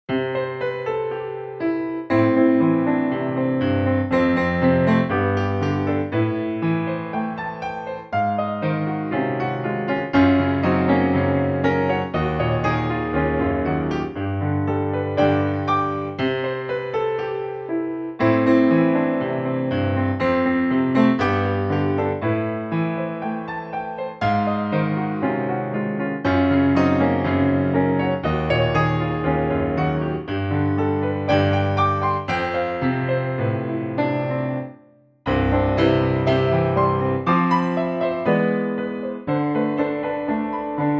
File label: Piano